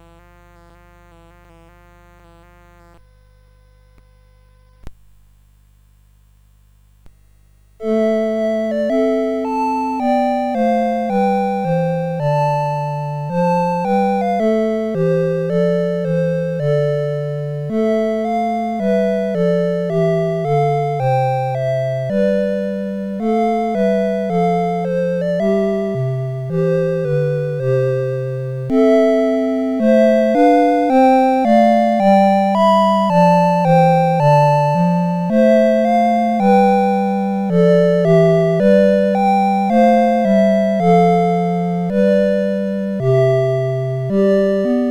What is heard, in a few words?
CSG8580; CSG8580R5